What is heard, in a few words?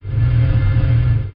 Sound Hum Air Scrape